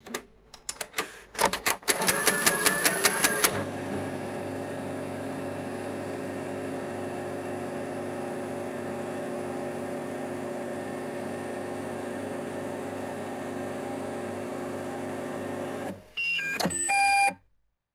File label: printer motor starting up humming and winding down; electricity